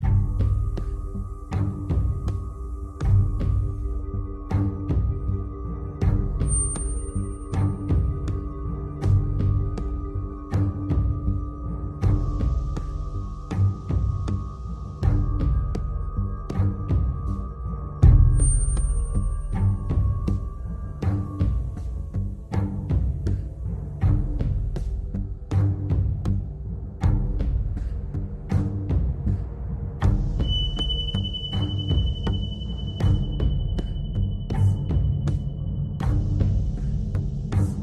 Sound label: loop Tense